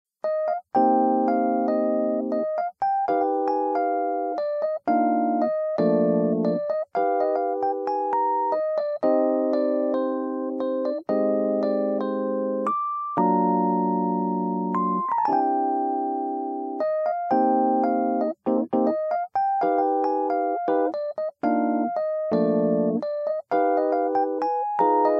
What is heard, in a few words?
mark 1b stage 1979 bright, fender rhodes